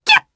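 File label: Jump, Japanese; voices; heroes; Lakrak; kingsraid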